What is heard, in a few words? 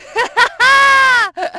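"ha ha", British; Splashdown; XBOX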